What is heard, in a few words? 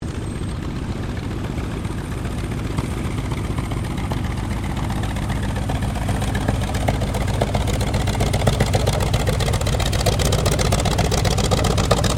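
AIRCRAFT TROJAN SQUADRON 4 TAXI SHUTDOWN; Mixed aircraft squadrons; Airplanes; Vehicles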